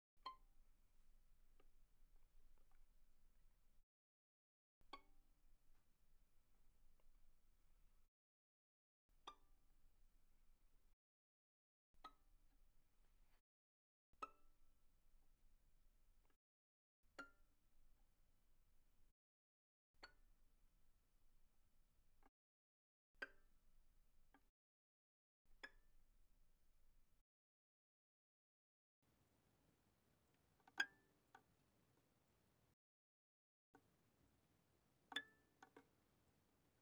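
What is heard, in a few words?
Violin pizzicato pianissimo sul E C6 B6; Strings; violin2012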